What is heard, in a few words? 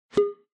Onoma Pop Mid